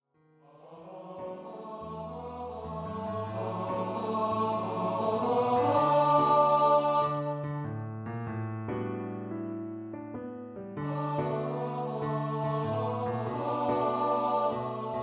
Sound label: 2 part song for Male Voice Choir